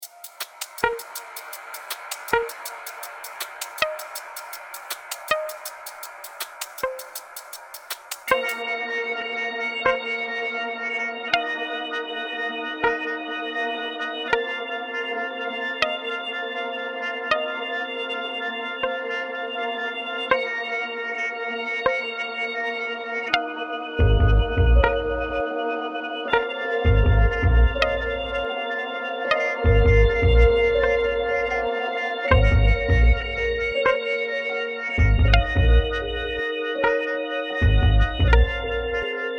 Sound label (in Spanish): MUSICA PARA TUS ESCENAS TENSION